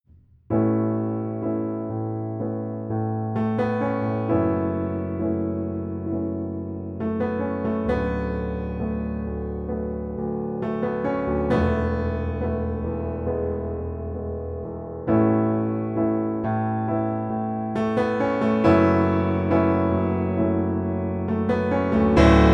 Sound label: Bluethner 278 Player